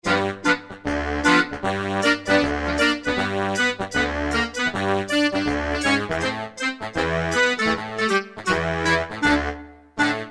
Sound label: Dixi Brass, Alesis QS8; Factory Preset examples